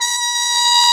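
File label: brass plunger trumpet, plunger mute; Roland L-CD702; Plunger & Wa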